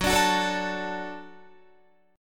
F sharp Minor Sharp 5th F sharp Guitar; F#m#5 strummed; 14,17,16,14,14,16

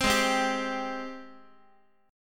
G sharp Major 7th, Guitar; strummed; x,x,3,6,4,6; 4A,4E,5A,5D,5G#,6C#; G#M7

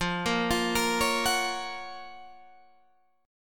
Gb Major 7th F, guitar

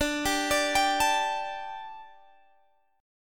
D Suspended 4th; arpeggiated

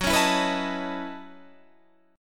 F sharp Minor 13th, Guitar, Standard; strummed; JGuitar

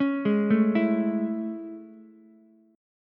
A Major 7th A, Ukulele; AM7 arpeggiated; JGuitar

